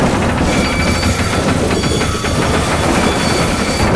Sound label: plane scrape loop; War Thunder